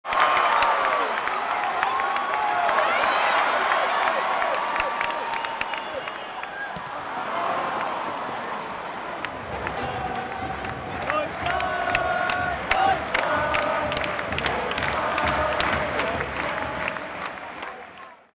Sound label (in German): Atmo Stadion Rufe; Augsburg; 2016; Sternsinger-Cup